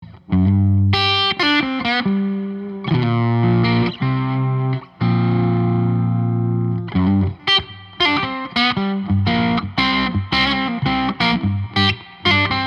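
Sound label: Fathom overdrive, electric guitars, semi hollow; all mahogany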